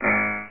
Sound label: BUZZ; DinoTrilogy